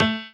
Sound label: piano melo